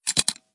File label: Checkbox Turned Off; RimWorld Biotech; RimThemes; Hunt For Me